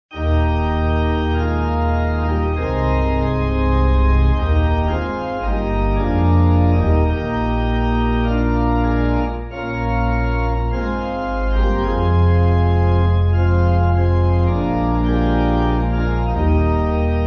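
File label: Pipe Organ, Music Backing Files for Churches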